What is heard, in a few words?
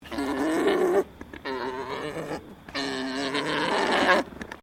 Animal Alpaca Male Mating Call; Alpacas on a farm in Gippsland Victoria; Miscellaneous Animal